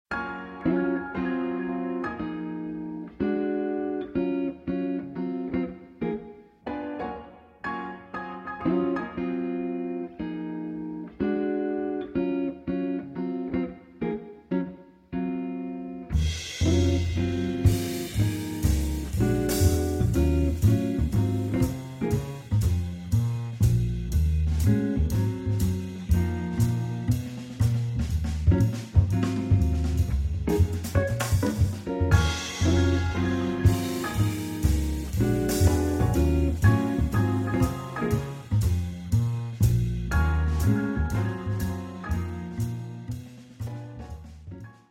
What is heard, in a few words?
Jazzy Piano Mood